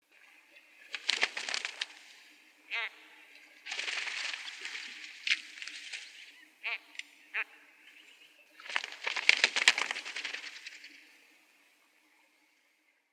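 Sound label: turuhtan voice, ruff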